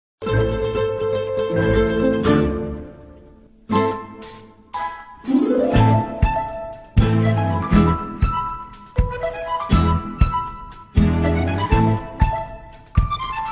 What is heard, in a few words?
floor music; ratatouille